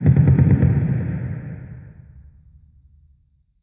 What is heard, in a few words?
guns distant